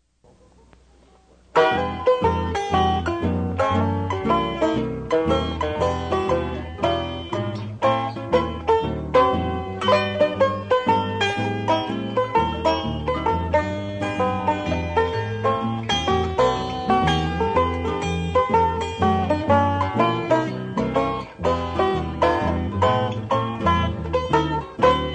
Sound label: banjo